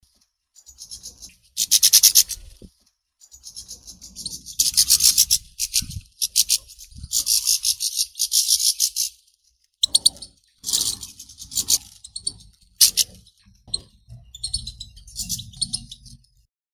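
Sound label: grey-headed goldfinch, Carduelis caniceps; shegol sedogolovii; zvuki prirodi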